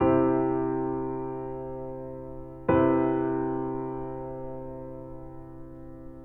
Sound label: Major and Maj7